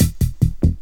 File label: zippo; Loops; Electronic